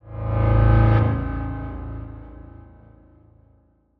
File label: Horror Accent